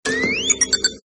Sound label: bones tone